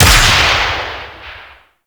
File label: Heavy Shotgun Projectile Explode B; Warframe; Tenno